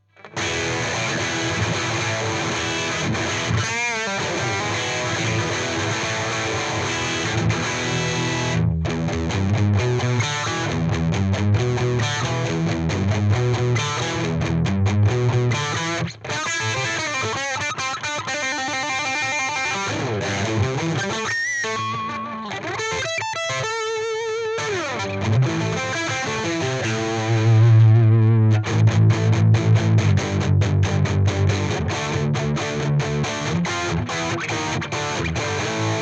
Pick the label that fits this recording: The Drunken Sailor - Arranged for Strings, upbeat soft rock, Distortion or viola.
Distortion